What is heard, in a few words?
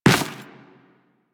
Run Dirt, footsteps; Warframe; Teshin